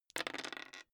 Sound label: dice roll table clatter